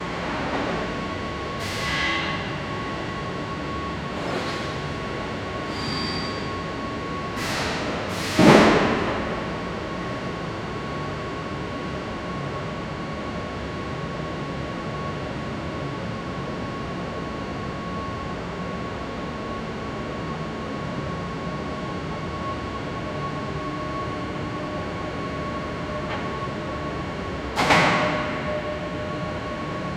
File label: industrial noise of a ste, metalworking